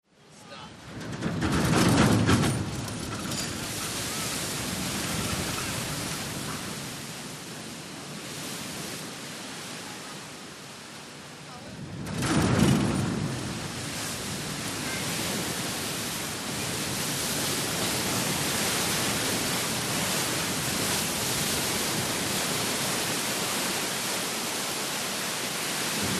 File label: BOATS & SHIPS STATEN ISLAND FERRY: EXT: Docking, crash, walla, prop wash, engines reverse, chatter, gates clatter.